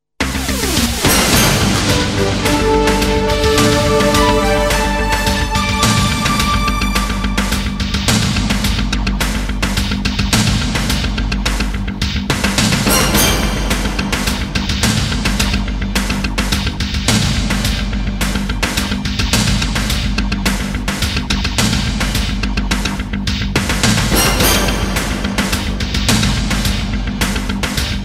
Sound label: News Radio Tv